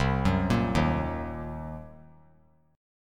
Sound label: C Minor 7th Flat 5th, chord; Cm7b5 arpeggiated; JGuitar